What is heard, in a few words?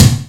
ambient kick; Greytsounds VOL-1; PROCESSDRUMS